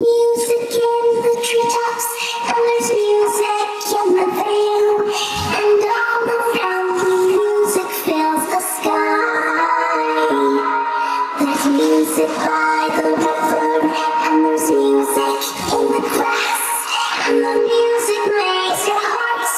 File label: vox; fluttershy; Pony Fan Music Remix Stems